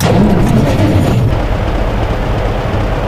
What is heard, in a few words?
crane start engine; Source Engine; video game